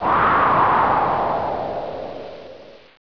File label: gremlin; Kinga 2.0